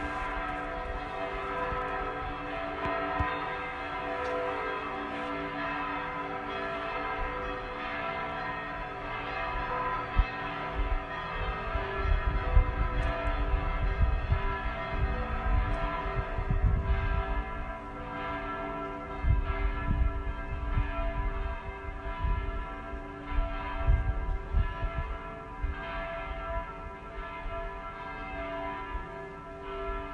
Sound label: Matera church bells